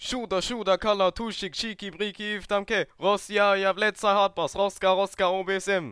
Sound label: hardbass acapella